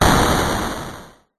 retro game classic explosion 8 bit arcade 80s weapon attack